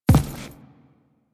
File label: Footstep Default; Warframe; Railjack Crew; Steel Meridian